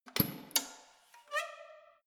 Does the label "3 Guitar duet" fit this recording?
No